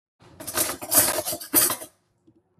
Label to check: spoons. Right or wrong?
Right